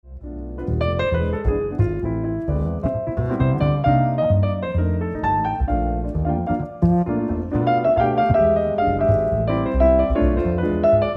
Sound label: ewi piano duet